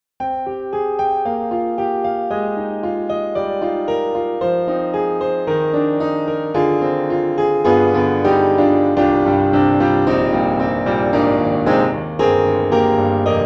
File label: Pianoteq Grand C2 bright; MODARTT S.A.S.